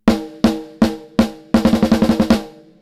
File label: snare; Valves